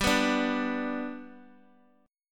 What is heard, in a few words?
G Major down; G strummed; JGuitar